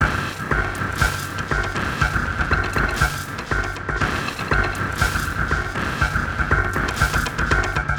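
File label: Loop, beats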